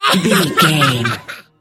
voice female laugh evil

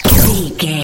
sci-fi weapon blaster laser boom heavy

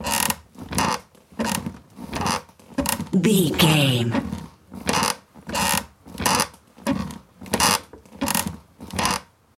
chair frame metal creak squeak loop

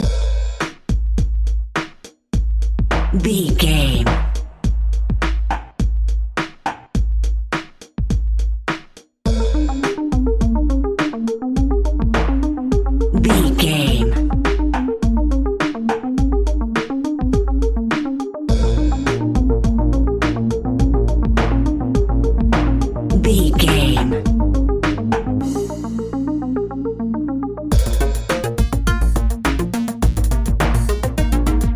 Machine Music; Film, TV, Computer Game

